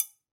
Triangle C Mute; Latin Percussion; Drums; Drum Hits; Bell; Ableton; Factory Packs

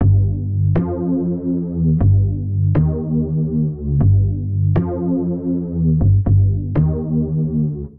Driving Bass - GMaj 120 bpm; MIDI Clips; Tonal; Motifs; Ableton; Factory Packs; Mood Reel

